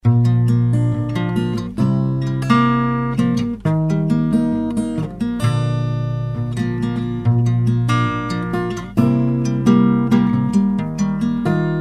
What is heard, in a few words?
G, A7, C, G - G, Dm7, C, G, Guitar